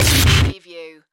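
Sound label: Production Element Hit Distort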